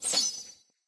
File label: hop, antlion guard; hl2